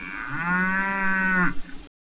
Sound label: cow